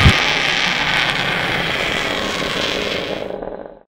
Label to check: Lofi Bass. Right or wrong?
Wrong